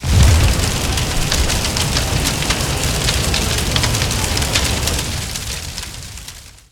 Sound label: forge burn; smite; vulcan